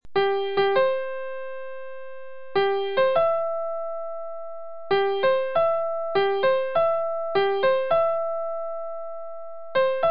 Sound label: guide taps